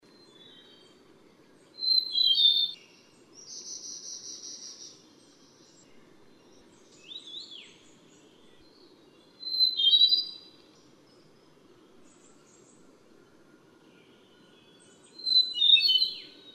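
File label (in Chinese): 白尾鴝, Yushan National Park; 白尾藍地鴝; 鶲科/留鳥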